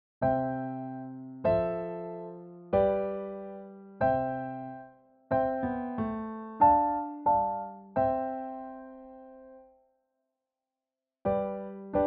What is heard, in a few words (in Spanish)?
Piezas con acordes